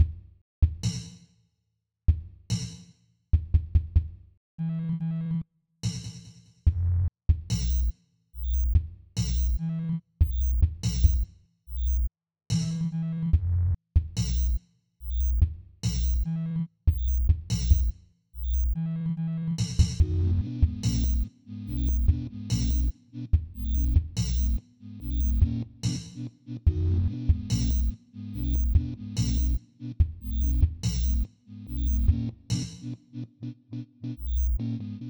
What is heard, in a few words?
Sinewave